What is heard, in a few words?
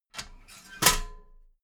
metal ladder small handle fold regular